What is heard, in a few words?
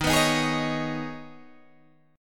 Eb 7th Suspended 4th Sharp 5th, Guitar Open G; down